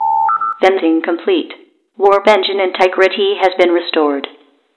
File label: Comp Seal, Plasma; SpecialFX; NanoFXv2